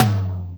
Percussions

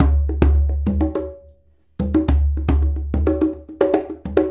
Djembe and Conga

